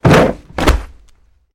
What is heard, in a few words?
Body Falls On Crate